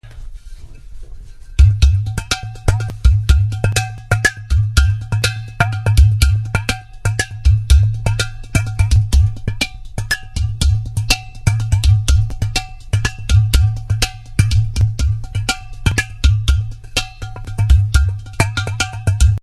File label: gatham